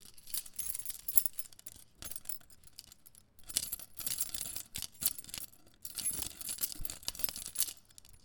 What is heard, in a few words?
Key Jangling; Walker Lab